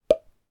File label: Pop Low A